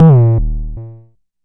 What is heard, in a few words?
Jomox MBase, drum machine; Jomox MBase 01